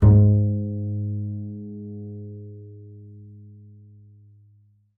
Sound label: G#2, bass pizz; Xsample VOL-5